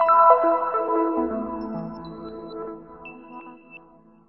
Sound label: pretty tones big space; Computery or Science Fiction